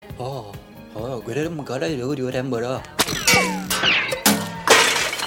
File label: ooohhhhh